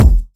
loud kick single hit e key, kick single shot tuned to the E note; kick drum samples